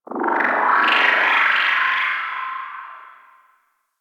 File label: Ship Data Chirps; Ambience; Warframe; Corpus Ship Remaster